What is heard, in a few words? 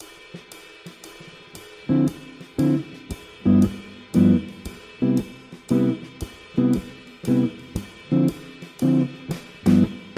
audio jazz; ritmos de jazz